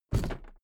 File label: suitcase drop